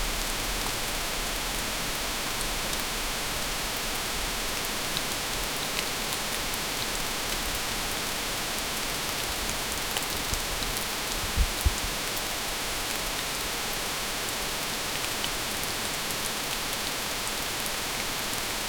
heavy rain in the forest

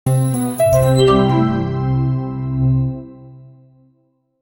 windows startup

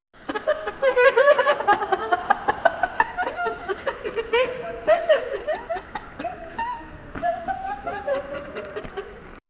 sound of distant mocking, social

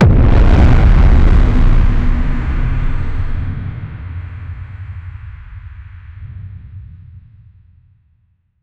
trailer impacts

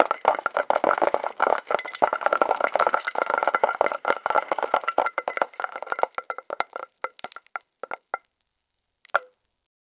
sound of coffee beans pou, roasting

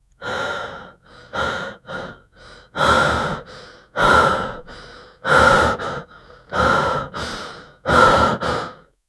person breathing audibly

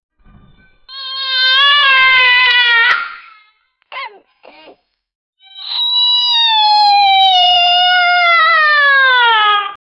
child crime

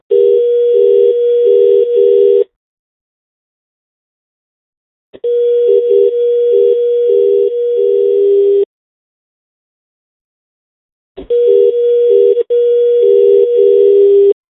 a comical ringing of a, phone

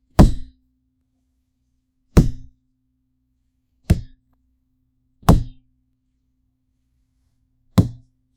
a crisp realistic sound o, card game

